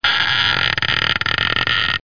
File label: door creak; DR BRAIN; BUGS